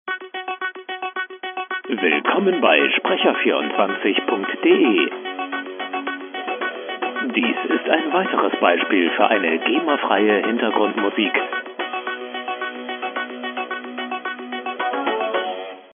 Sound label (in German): musik